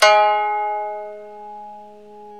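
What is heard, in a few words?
ethnic plucks, koto; Eth Plucks, Pluck Duo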